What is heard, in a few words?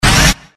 pickup speed; disneyxd; stuntastic